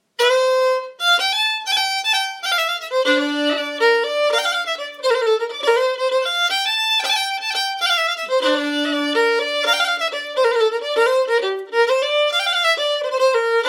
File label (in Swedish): Melodi